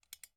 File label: click switch; angband